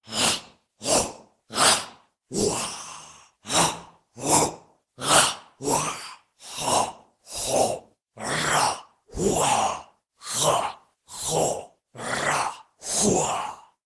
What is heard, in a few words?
Phlegmy Remix Of Tribal Battle Chants Shouts